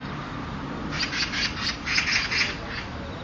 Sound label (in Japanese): kasasagi